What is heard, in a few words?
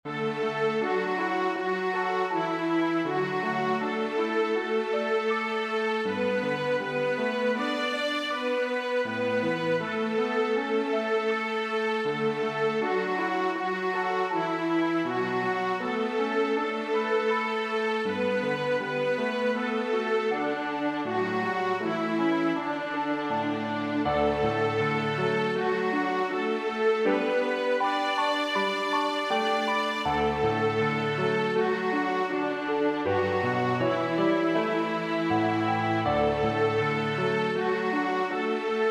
Jesus Loves Me w Piano